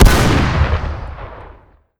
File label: revolver shoot; weapons; tf2